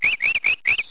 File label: bird; Deers Revenge